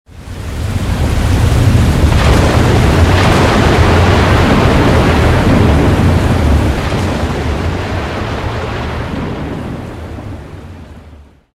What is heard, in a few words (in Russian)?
grom